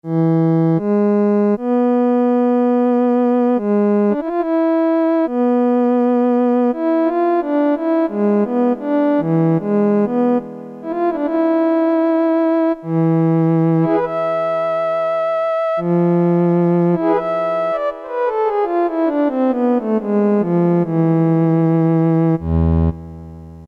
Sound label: cello, Casio MT-68; preset sounds